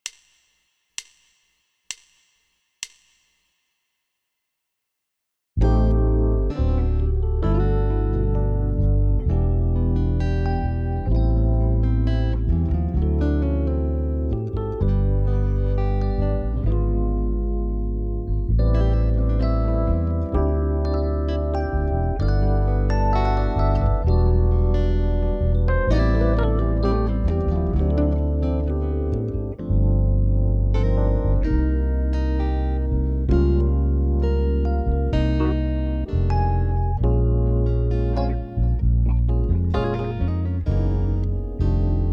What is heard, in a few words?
DRUM SET Play Along SMOOTH BALLAD